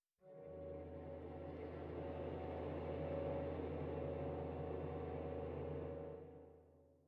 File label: Storm Far